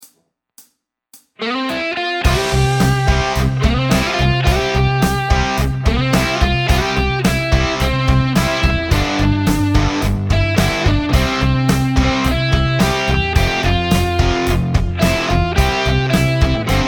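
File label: backing power chord a, guitar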